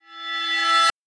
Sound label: bell rev